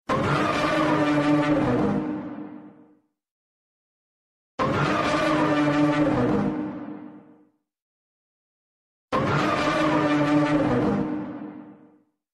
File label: All Sirenhead Sounds